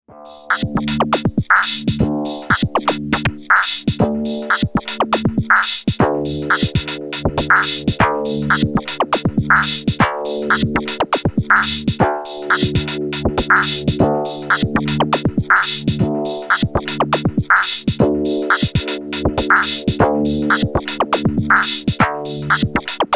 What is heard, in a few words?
Beat; Erica Synths Perkons HD-01